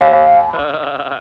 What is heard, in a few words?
boing huh uh; fudd